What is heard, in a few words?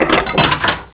cannon activate; Nokia; 5800 XpressMusic; Bounce Touch